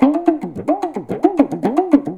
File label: PAPAN, Indian Traditions